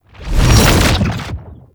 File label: Latch Fly; Roathe Summon Gulphagor; Warframe; Tau